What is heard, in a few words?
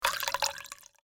Flood Goal Water; My Cute Commissar